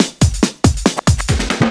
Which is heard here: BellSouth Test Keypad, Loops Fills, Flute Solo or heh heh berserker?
Loops Fills